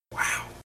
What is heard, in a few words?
bouncy creeper death; skyfactory4